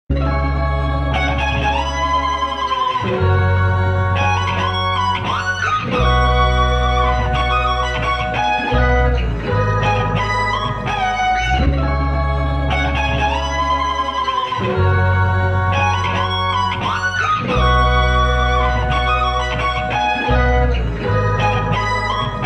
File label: Instrumental